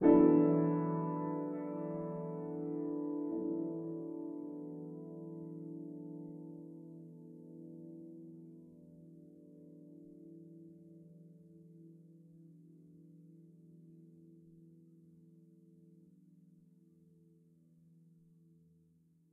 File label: Piano